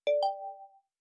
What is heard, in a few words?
message